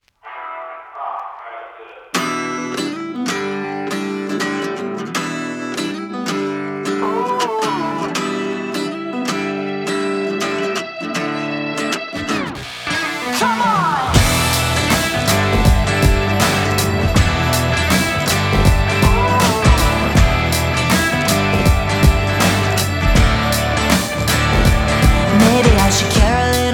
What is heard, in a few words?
Acoustic